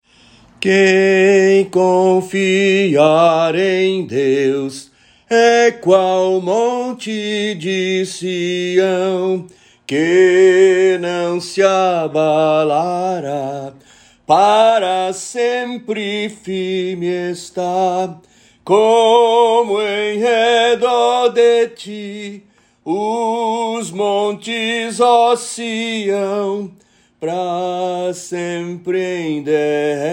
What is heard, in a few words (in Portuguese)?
salmo cantado